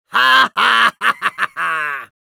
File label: Demoman laugh evil, es